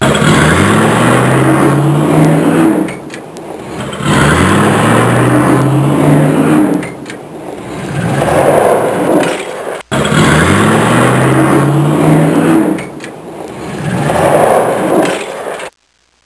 Engine Acceleration, Shifting; Soundsation; Vehicles

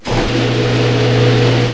TV set; My Science Fair

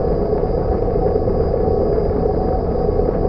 warp engine; Earth and Beyond; EA Games

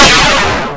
Warp; Zone Warrior

